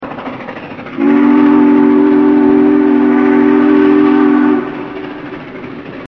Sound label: train rails; King of train rails